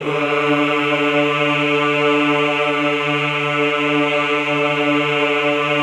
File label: EHH D2, Classical Choir; Best Service; Extended Classical Choir; EHH MIX